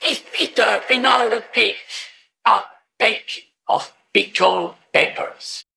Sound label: jingle